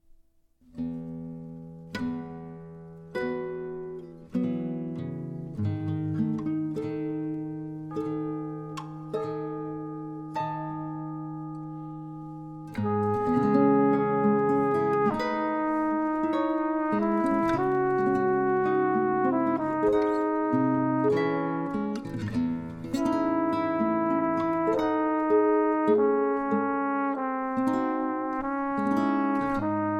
Intercontinental Concerto for Trumpet

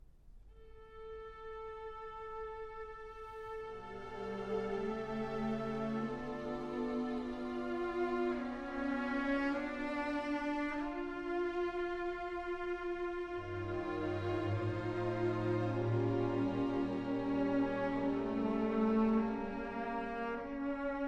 Concerto For Horn and Strings, Adagio molto

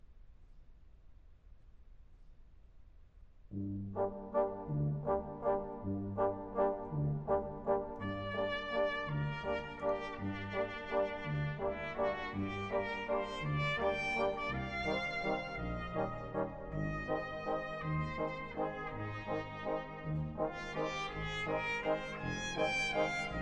Waltz, Dances With Brass; Jazz Suite No. 1

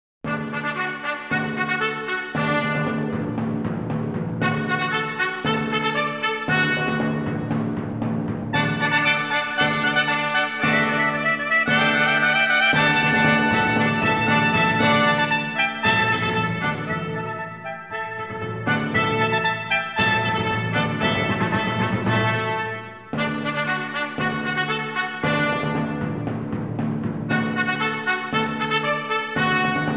Fanfare, brass; Three Fanfares

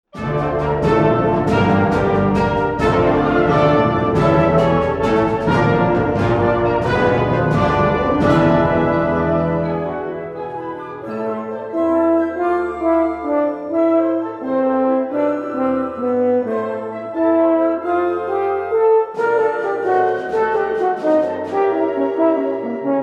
Concerto for Horn and Wind Band, Rondo

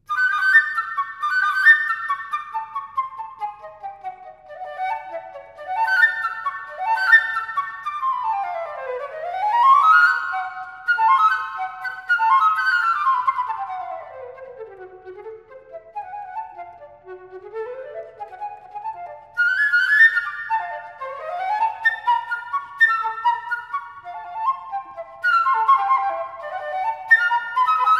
Preludes For Unaccompanied Flute, Allegro molto

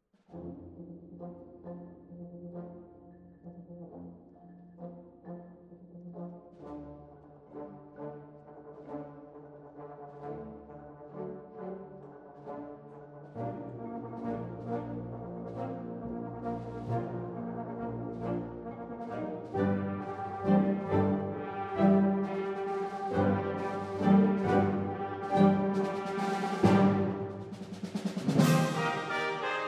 Symphony In E Flat For Wind Orchestra, March

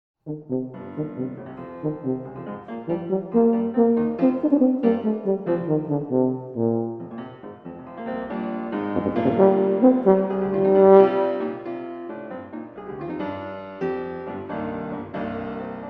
Sonatina For Bass Tuba & Piano, Allegro

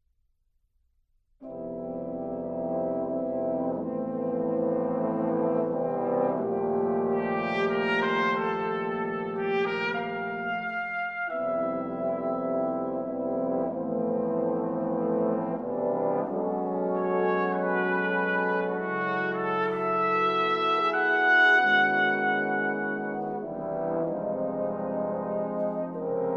Chants and Flourishes, Chants, brass quintet

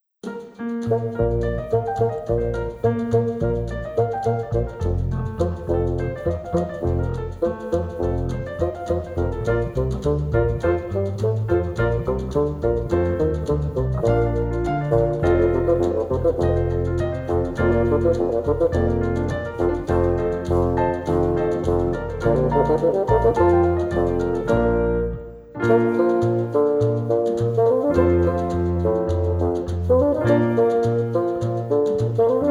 Bassoon and Jazz Trio Allegro

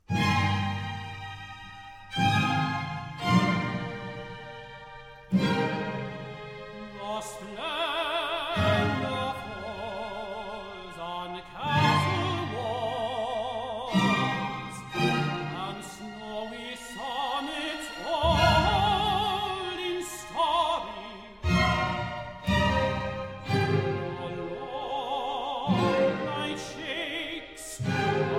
Serenade for Horn, Tenor & Strings, Nocturne

